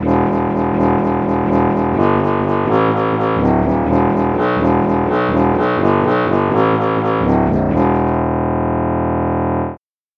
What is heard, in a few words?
trombone bass